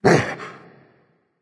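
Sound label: non verbal fear, voice; cernunnos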